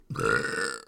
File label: human burp; bricks in motion sound library